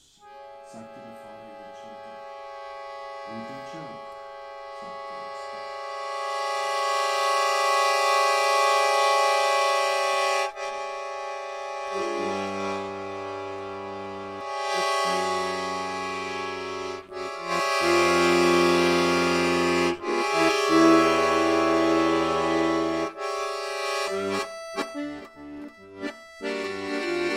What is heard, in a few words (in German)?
drehleier; krimilesung